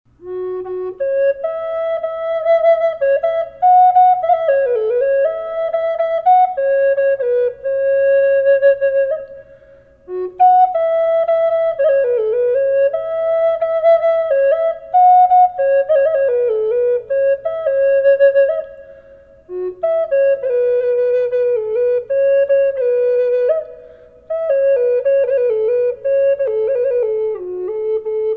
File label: reverb f sharp; collector flutes